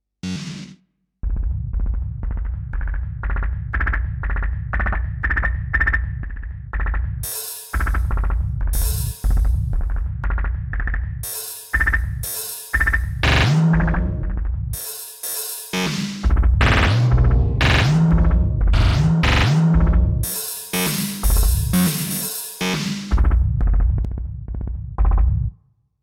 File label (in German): Resonanz; ADD-One